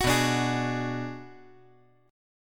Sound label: B Minor 6th B